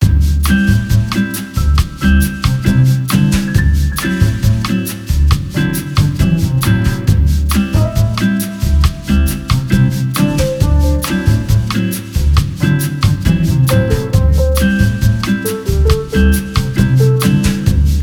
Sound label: menu music; Cook Serve Delicious